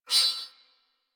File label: Beep Comby; Warframe; Ambience; Corpus; Beeps